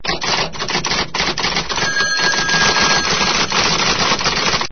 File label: Office Sounds